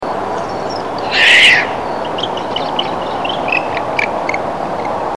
Barn Owl

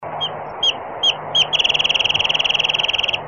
Wrentit

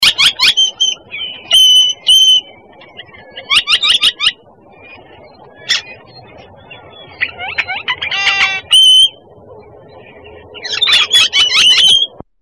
Great Tailed Grackle

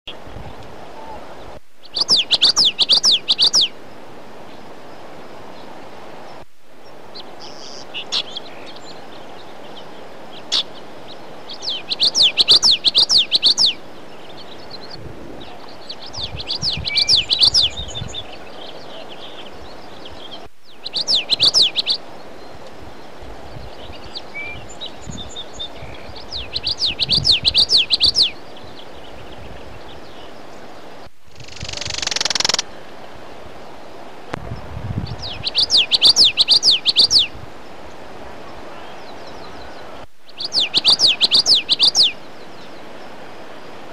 Common Yellowthroat

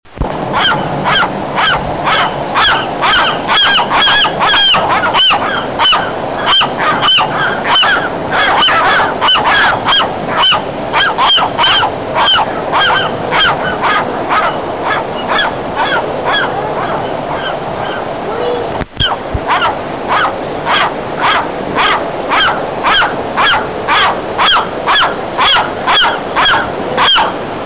Western Gull

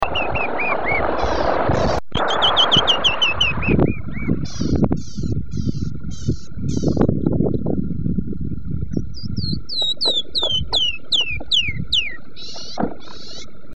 Canyon Wren

